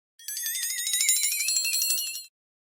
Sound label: Flexatone; GTA San Andreas